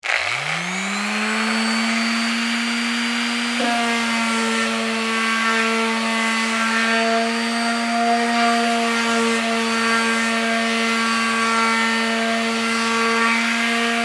Palm Sander Wood, Sand, 2X4; Sander Sound Effects; SoundEffects-BlastWave

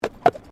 Tram Horse Drawn Horse Step Gravel

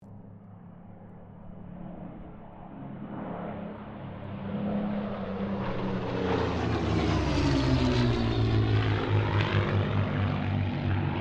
AIRCRAFT SPITFIRE MUSTANG KITTYHAWK PASS DISTANT; Mixed aircraft squadrons; Airplanes (Sound Effects:Vehicles)

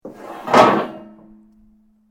metal rods move

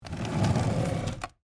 Wood Chair Slide, Drag; Foley; Wood Sound Effects; Wood (Sound Effects:Materials); BlastWave

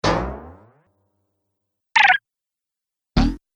Videogame Fx; Sound Pack 11; Video Game Sound Effects

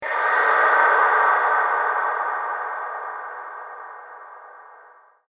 Unearthly Spirit; Ghost Sound Effects; horror

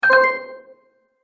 Magic Purchase Brief Ding; Cartoon Sound Design Sound Effects

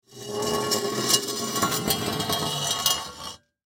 Metal Pole Drag On Cement; Metal (Sound Effects:Materials); Foley; Blastwave

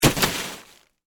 Horror, Body, Fall, On, Plastic, Tarp; Blood and Guts; BlastWave

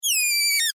Foley Balloon Expel Air Squeak Short; Balloons; Cartoons; SFX Bible

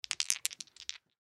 Dice Shake, Dice Two Short Shake In Hand; Casinos

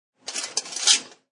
Food Mill Crank, Food Mill Single Crank; Cook works a grinds food mill; Food Prep; Restaurants

